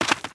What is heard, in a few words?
Leopard Run; Seer; GamigoNA